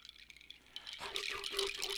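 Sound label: Music Village Calm Near; From Dust; ps3; xbox 360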